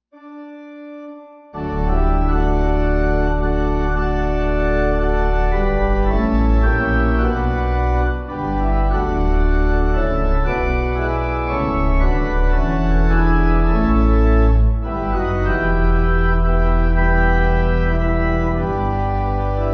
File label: O Father Let, Benediction, Pipe